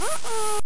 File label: Digit Ohhh ohhh; Puffy's Saga; UBI Soft